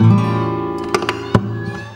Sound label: STL STRNG CRD; Best Service; World Colours